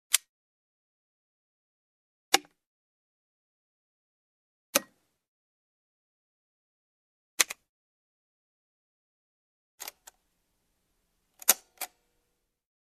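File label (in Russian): звуки различных выключателей переключателей кнопок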